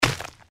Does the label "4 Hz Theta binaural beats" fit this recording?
No